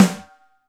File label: Snare, Alesis DM5